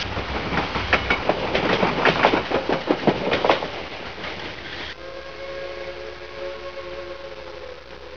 steam locomotive with bell and whistle

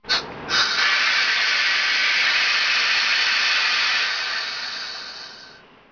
Train steam whistle

